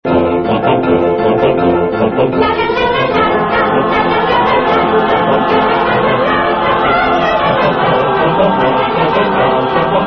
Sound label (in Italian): Coro Dei Pompieri